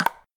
minus button; buttons; Xing Yun Jin Chan

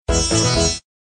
WIN; Safari Heat

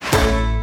freespin wild; Wild Guns

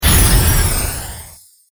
UI card; Paradise

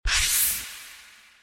slide; Great Book Of Magic; Wazdan

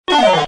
gamble start; American Poker The Legends II

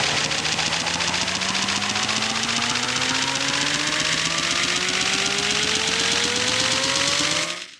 long spin; In The Forest; Wazdan

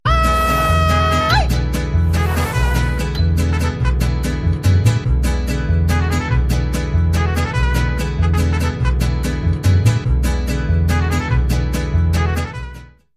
star long; Fruit Fiesta; Wazdan

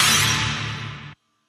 blink winlines; In The Forest; Wazdan

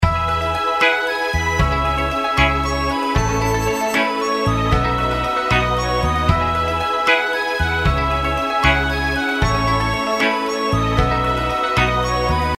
intro bgm; Golden Shanghai

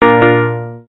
WIN; Multi Win Triple; multi card win triple

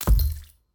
gambler mouseclick; Great Book Of Magic Deluxe; Wazdan

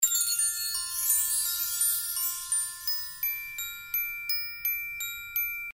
outro; Jelly Mania

